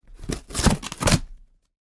Cardboard Tear

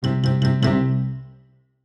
cartoon knock knock knock knock at door

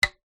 sport tennis racket string pluck pick finger; zapsplat

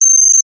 science fiction data beeps

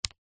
camera dslr switch on or off canon eos 6d; zapsplat

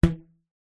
foley elastic band ping

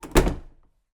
household door front shut internal

